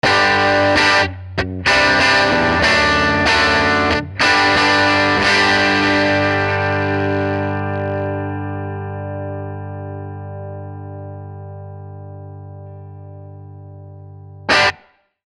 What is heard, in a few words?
Les Paul